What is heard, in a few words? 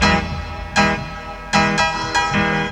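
PIANO loop; USB Soundscan vol.27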